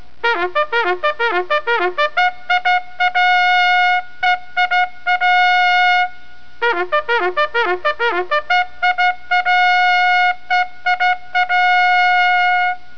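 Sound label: call to arms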